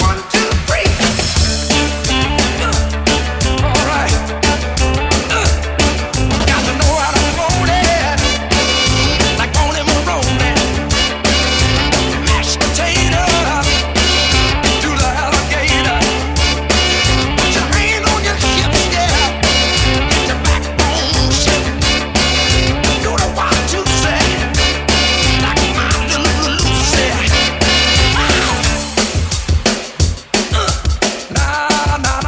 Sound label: 176bpm Dm